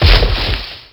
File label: fall sand; hits; Tony Hawk's Project 8; XBOX